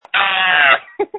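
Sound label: screams that feature laughter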